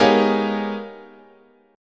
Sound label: Ab Minor Major 13th, guitar chord